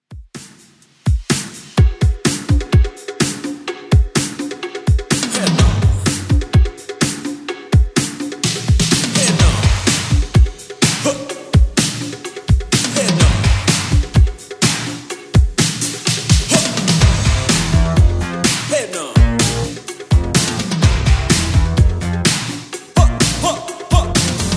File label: 80s, 126 bpm; Redrum